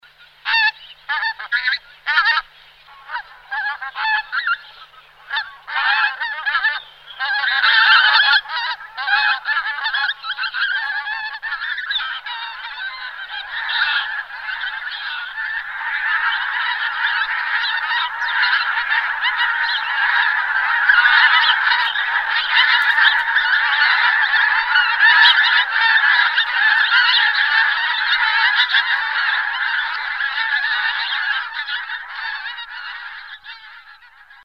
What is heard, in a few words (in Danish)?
Kortnaebet Gaas; UgensFugleStemmer